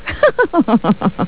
laugh

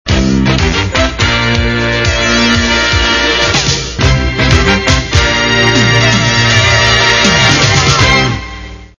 rgb music

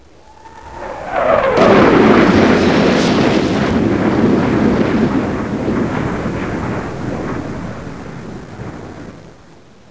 flyby

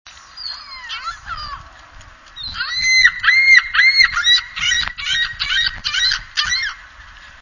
gull ring-billed, Ring-billed Gull; Perth Amboy, NJ; 1:30 p.m. by Raritan Yacht Club

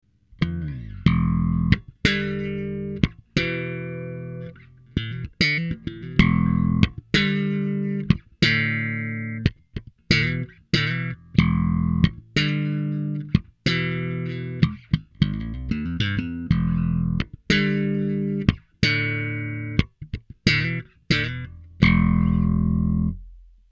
svt slapped, bass; sansampbass

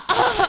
hit; 夏洛特聲音資料庫

